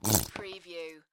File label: Squeezy Honey Bottle; beanstalk audio